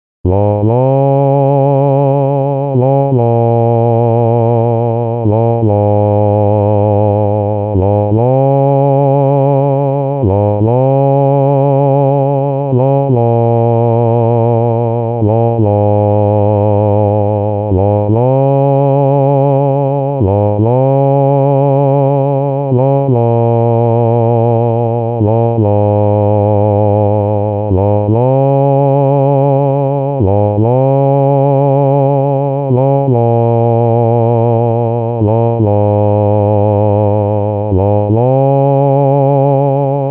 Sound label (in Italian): bassi, Coro; musica afroamericana e work song